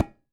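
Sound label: BANYA MUTE right; AKAI S6000 CD-ROM; WorldSounds; TABLA+BANYA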